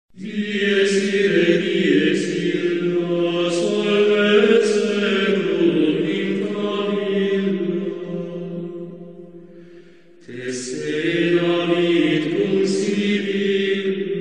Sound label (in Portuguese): canto gregoriano